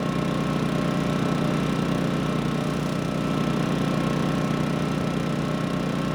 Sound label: Interior Mall Generator Loop; Ambience; Props; Warframe; NineteenNinetyNine